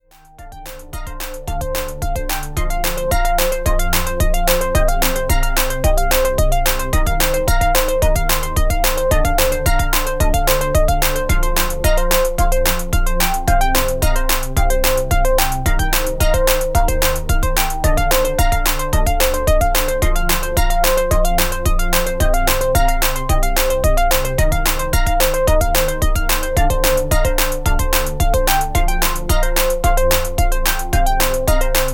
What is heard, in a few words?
meditation